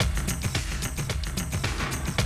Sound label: drum loop, 100 BPM